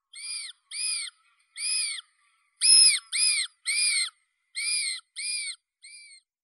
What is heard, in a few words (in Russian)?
roller, zvuk sizovoronki